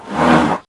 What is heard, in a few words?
Mammoth Hurt Sound; Heroes3; HotA